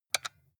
console controller xbox xyab press hard

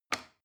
plastic button small release heavy

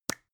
plastic spray can small open short

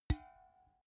big can empty hit soft

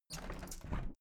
window plastic squeak open regular sequence

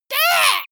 monster painful cry high

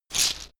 shaker wood rough hit single soft

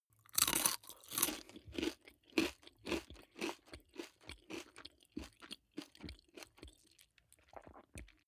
eat large potato chip mouth closed

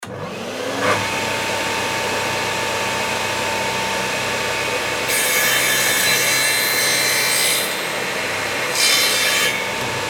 chain saw

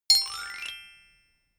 notification glockenspiel slide up hard

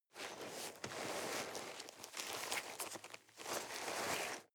nylon leather soft rustling

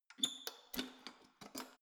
letterbox metal close lock key out soft

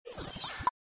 high frequency cut

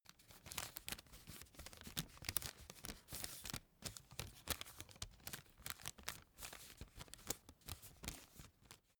cigarette rolling paper handle

